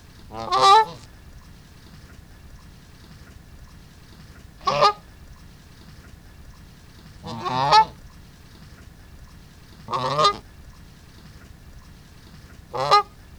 Branta canadensis loud call

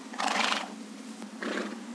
Equus kiang snort

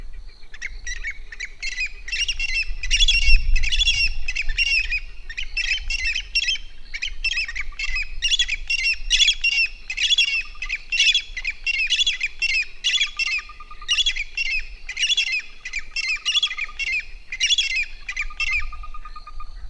Scleroptila levaillantoides

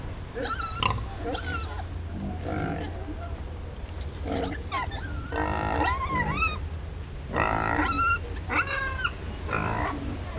Sus scrofa domestica

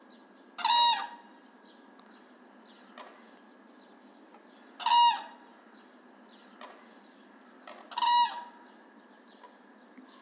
Grus nigricollis one

